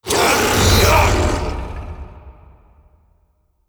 samurai Win; netent

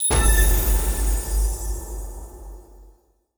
medium Win; OceansGold; netent

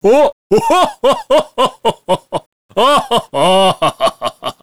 Big Win Super Mega Start; santa

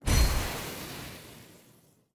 7x multiplier; netent

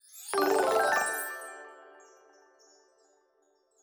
fs Fruits Salute

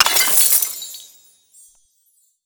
bonus Bomb Coins; netent

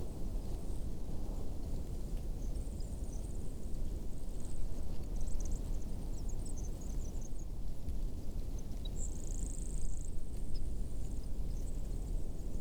ambience; netent

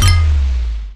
skip total win; netent

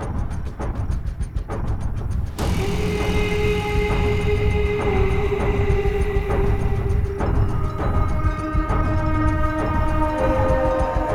free Spin Amb; netent

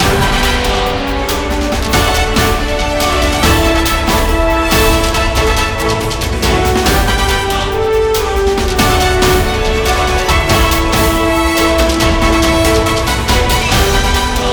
menu Ambience Uptempo; netent

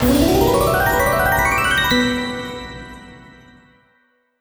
win Super Large; netent

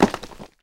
Reelstop Stone; netent

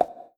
Attention Wild; secretofthestones

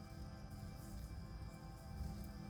win Fire; netent

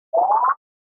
Health Meter Popup

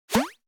fs Counter Appear

